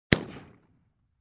Single pistol shot sound

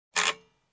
Printer Roll

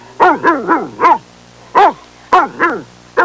Bloodhound barking sound effect short

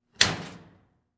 Steel Door close slam

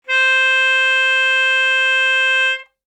Hohner melodica short

